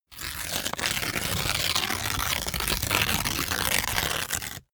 sheet crumpled tear up slow

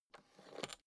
suitcase open latch lock

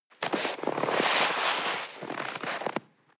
snow grab

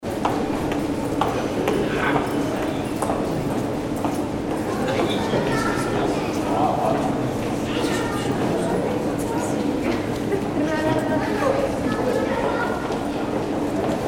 pedestrians in a hall

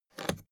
suitcase open latch lock fast

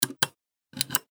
switch

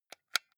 console controller xbox onoff press

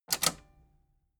metal button robust spring click disable fast

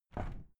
window plastic squeak open regular

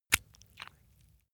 leather impact mud medium

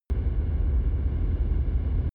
drone spaceship rumble

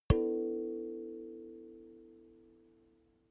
notification kalimba body hit

